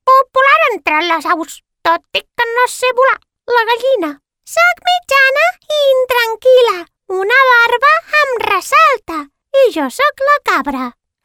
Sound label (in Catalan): animals agut cat